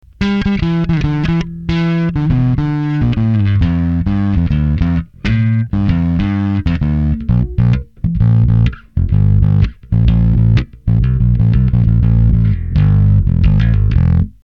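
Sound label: fingered, Bass Driver D.I.; sansamp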